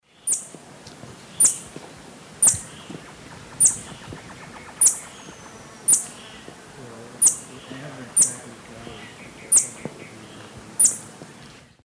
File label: Florisuga mellivora, song; S. America; Eastern Lowlands